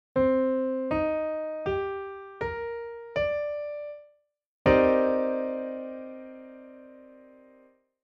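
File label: chord piano m7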